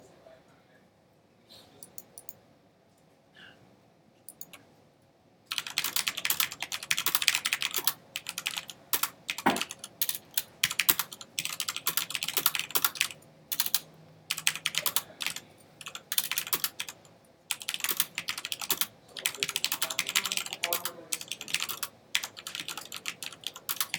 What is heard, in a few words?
Keyboard